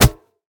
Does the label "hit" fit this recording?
Yes